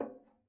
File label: Ping, Kitchen Toaster